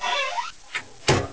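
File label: door close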